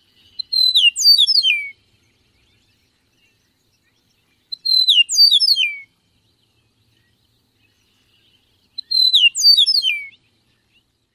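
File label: song, Eastern Meadowlark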